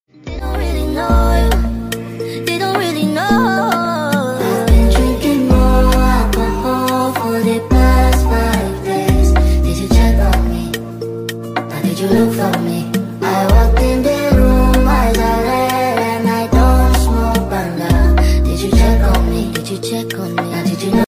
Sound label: Chill Relax